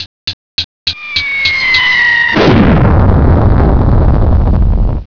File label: crash